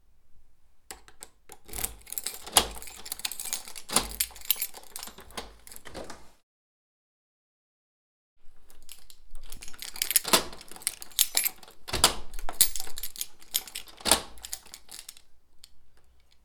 Keys Lock Door

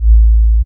Waldorf Attack Sub

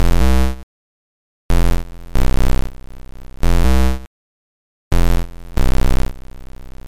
C64 Bass B 140

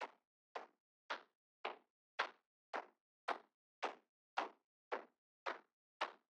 Footsteps On Dirt Retro Style

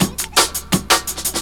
Helicopter

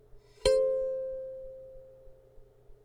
Kalimba C4 Wipe Med

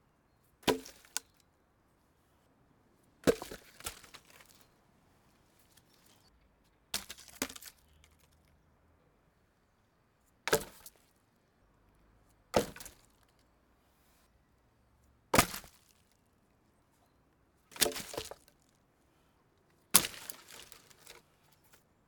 Logs on logs

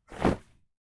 Jacket Cloth Rustle

